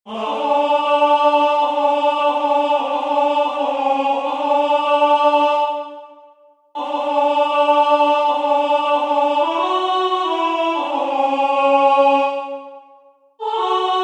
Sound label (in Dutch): SJALOOM - Alto